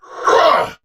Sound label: Attack Effort